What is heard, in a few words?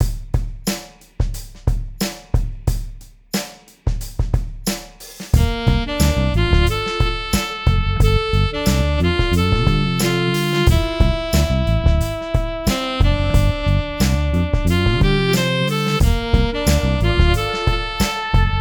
grooves